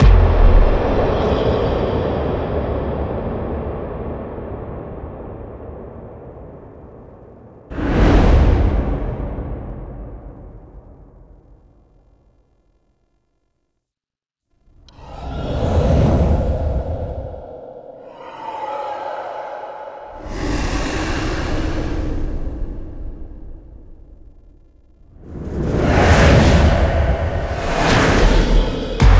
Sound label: Death SPFX; Cinematics; Archon Death